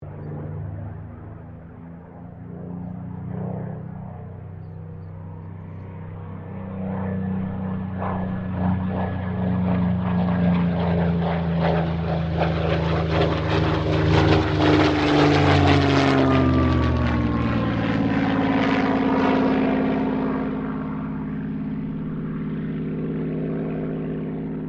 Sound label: AIRCRAFT SUPERMARINE SPITFIRE MK-XVI PASS